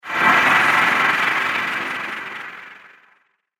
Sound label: Alien Synth Pad; Alien Sound Effects; science fiction sound effect